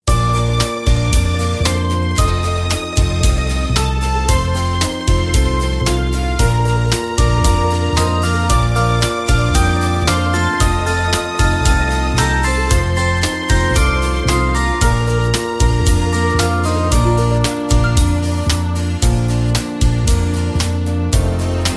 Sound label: No Backing Vocals